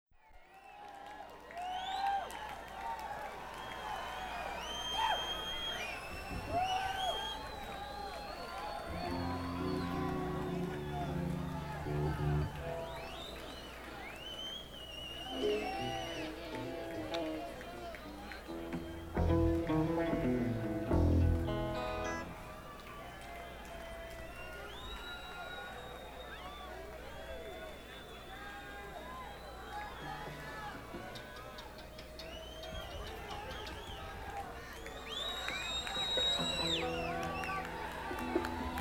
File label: Tuning